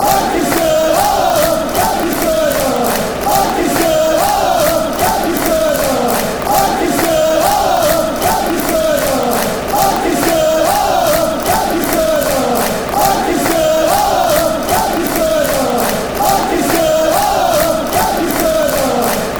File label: lancashire la la la fanchants; bfc